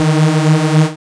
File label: BLAST Eb2, dubstep; Jack Sparrow; Deep Dubstep Progressions